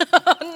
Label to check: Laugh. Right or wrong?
Right